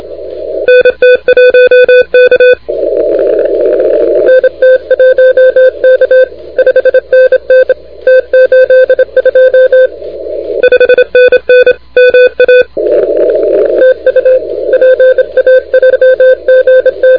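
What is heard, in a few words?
continuous wave 28MHz; ARRL 10M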